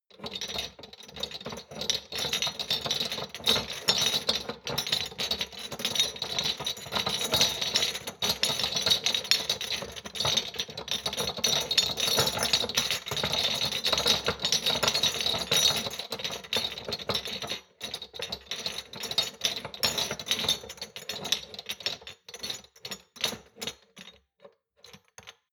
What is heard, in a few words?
shot glasses rattle soft, gastronomie; berufe; soundpool